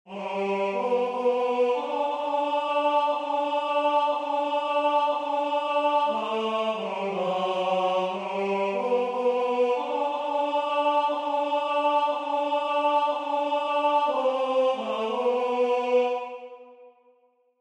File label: Alleluia, Tenor